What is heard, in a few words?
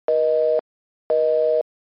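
SFX BUSY SIGNAL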